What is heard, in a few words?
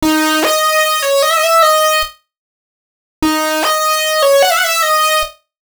Lead Loop D#, 150bpm; Dubstep; Soltan; Ghosthack; Dubstep Sound Arsenal